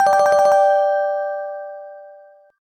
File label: Clean, BlackBerry